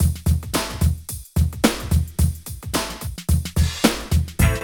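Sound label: DRUM loop, right; East West - Phat & Phunky CD2; 90's Sample CDs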